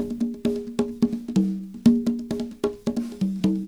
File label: con; Percussion